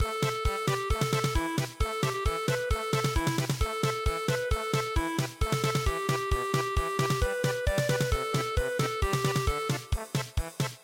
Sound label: polka, midi